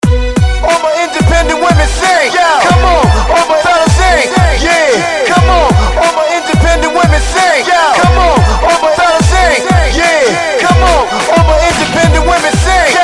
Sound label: Rap